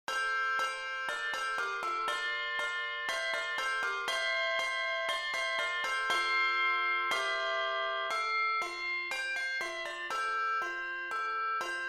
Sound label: Ding Dong Merrily on High, handbell